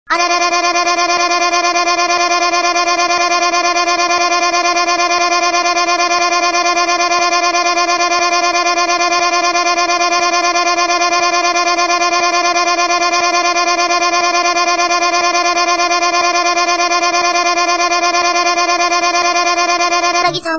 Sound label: ringtone